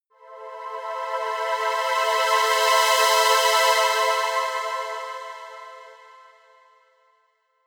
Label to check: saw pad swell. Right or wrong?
Right